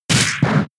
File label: Tackle; pokemon sfx gen 6 attack moves xy oras